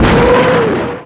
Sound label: Katusha Rocket Fire; Red Shark